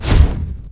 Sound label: spell impact